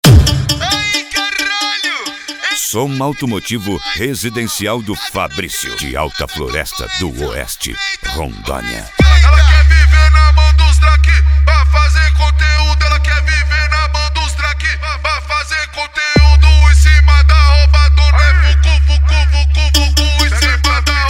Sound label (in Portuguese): som automotivo funk bass